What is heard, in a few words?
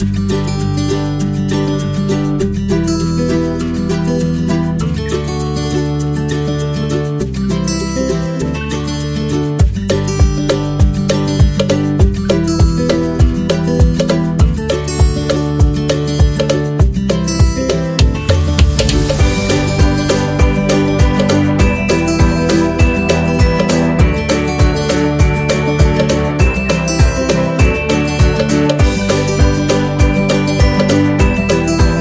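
Adventure Game, Upbeat and happy background music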